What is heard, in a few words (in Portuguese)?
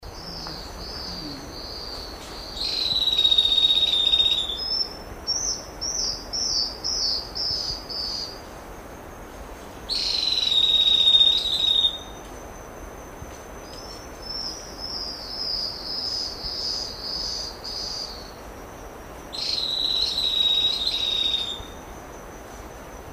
Canto do vira folha